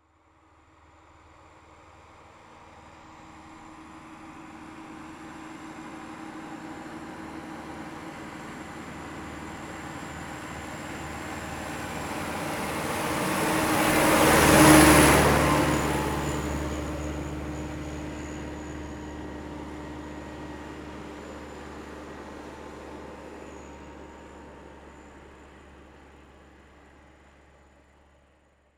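Massey Exterior Pass By Faded Short, Massey-Ferguson 3060E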